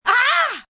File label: Pain Aah, Pain, female, startled; VoiceBox